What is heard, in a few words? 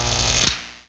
zap; Messiah; ELECTRIC